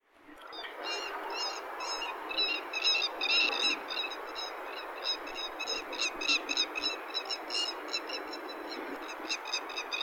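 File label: keri, grey-headed lapwing; fuji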